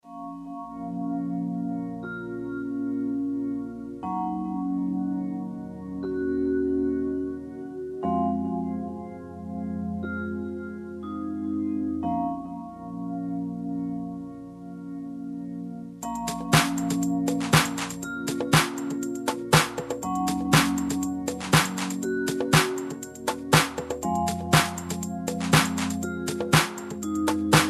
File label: quick chill out Pulsating Sounds